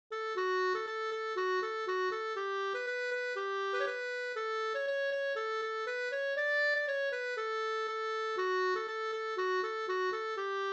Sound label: polka